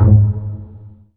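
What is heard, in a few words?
strings pizzicato; ILIO; Kirk Hunter; Virtuoso Strings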